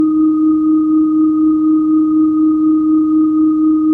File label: ev rev; Tesla